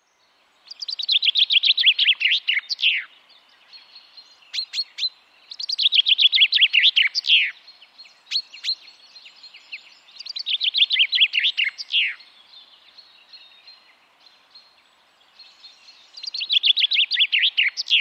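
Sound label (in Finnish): peippo, lintuäänet; Pirteä samanlaisena toistuva säe, kutsuääni ”tvink”; birdlife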